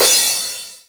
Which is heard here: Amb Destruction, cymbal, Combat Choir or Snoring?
cymbal